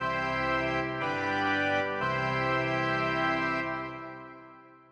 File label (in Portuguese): cadencia TDT